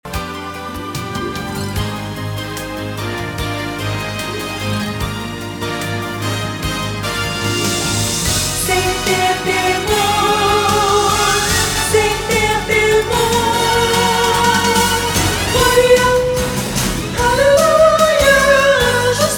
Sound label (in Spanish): Gloria Contraltos; cantata navidena